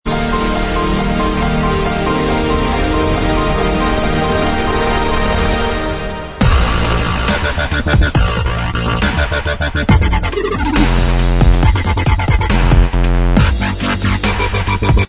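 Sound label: dubstep remix Inst Track, instrumental track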